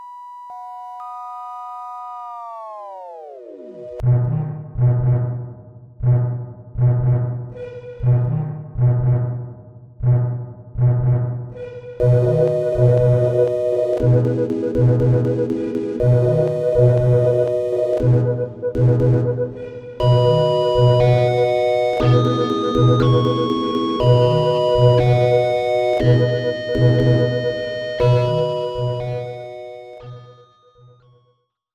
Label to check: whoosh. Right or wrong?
Wrong